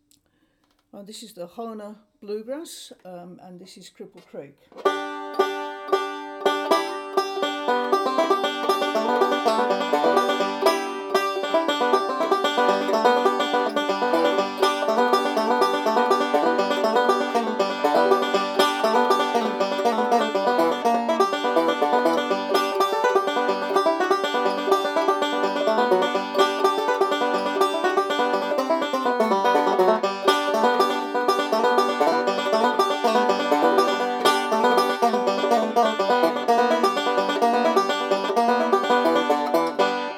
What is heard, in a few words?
Hohner Cripple Creek, banjo